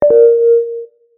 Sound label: pinpon